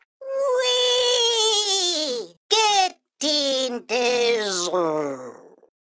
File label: whirl beep; ringtones